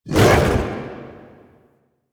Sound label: Axe Weapon Swing; Warframe; Tenno; Wolf Frame